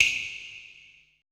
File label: SNAP; BPC PERC